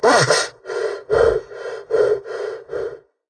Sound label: redout long